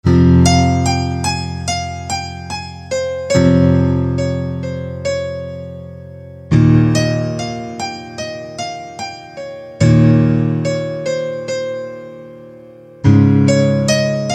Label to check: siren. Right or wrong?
Wrong